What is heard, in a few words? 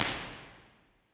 IR resynthesized; NCEM